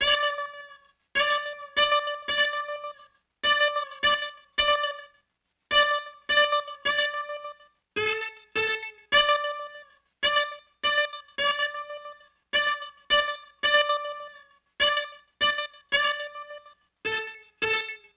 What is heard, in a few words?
Stringed Instrument; Sonic Foundry (Sony Creative Software); Stylus Pressure Urban Grooves on Digital Wax